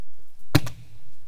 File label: male gunshot